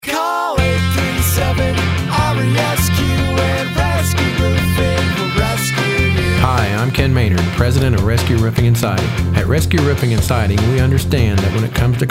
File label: jingle